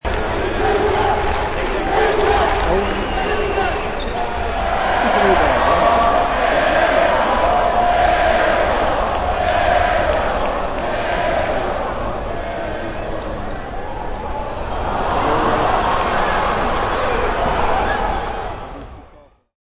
Arena Fl Sht Noiz, Noise from arena crowd during foul shots at basketball game.; Backgrounds; Arenas